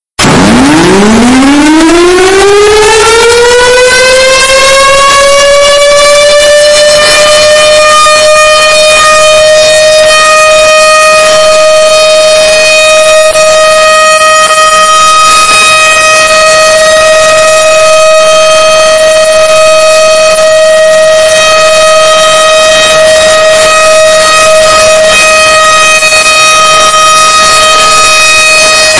tornado

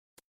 hitmarker

